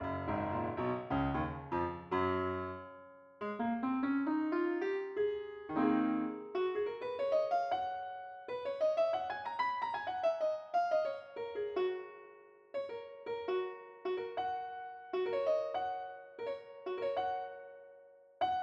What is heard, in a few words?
piano